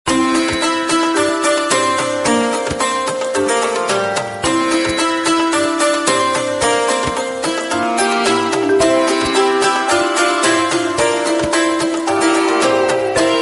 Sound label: Morning Melody; Samsung SGH-A797 Flight